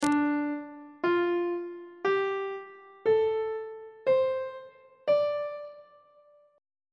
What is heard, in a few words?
d minor pentatonic scale; on treble clef